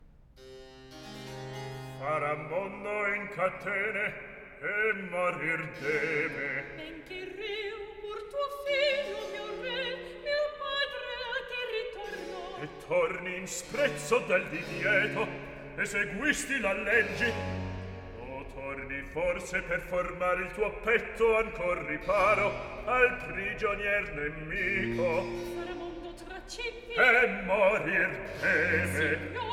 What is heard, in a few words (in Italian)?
Recitativo; Faramondo in catene; Handel Masterworks; Klassika